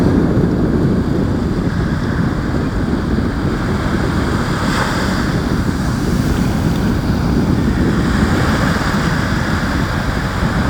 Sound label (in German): Meeresrauschen